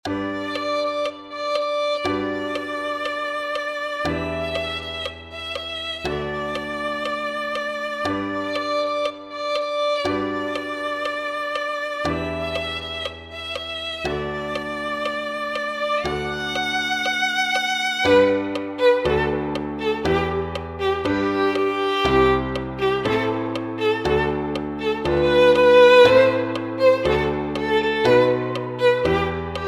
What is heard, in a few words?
Violin 2